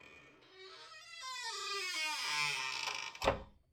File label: squeaky wooden door close slow; soundscrate